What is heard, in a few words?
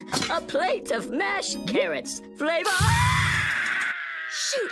Scream